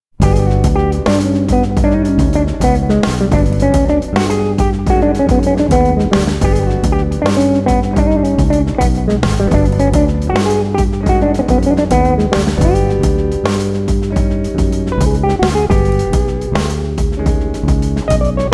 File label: puravi